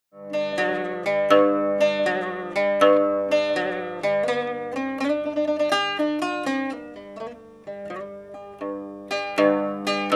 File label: Yueqin